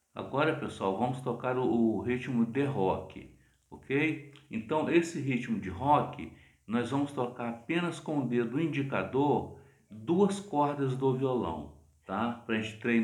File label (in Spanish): ritmo rock; ritmo rock 5